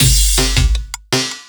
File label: LOOP, 160 BPM, Drum & Bass